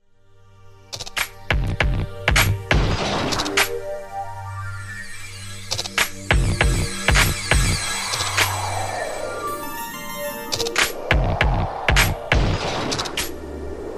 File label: instrumental